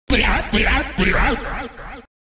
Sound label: laughs normal